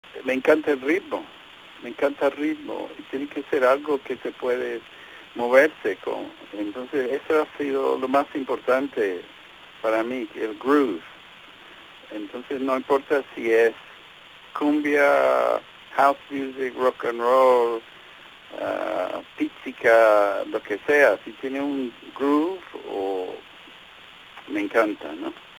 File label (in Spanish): ritmo